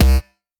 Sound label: kick; videogamebundle; soundscrate